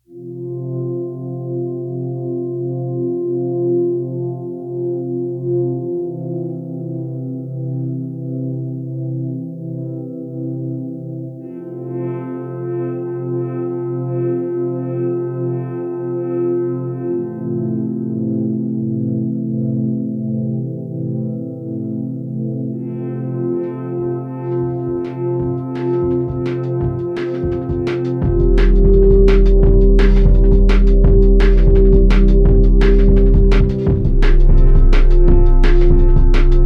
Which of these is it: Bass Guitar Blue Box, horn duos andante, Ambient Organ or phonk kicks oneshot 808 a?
Ambient Organ